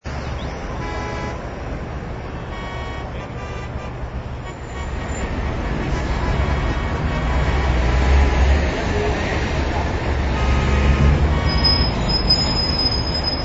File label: city